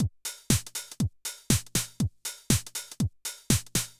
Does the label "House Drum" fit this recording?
Yes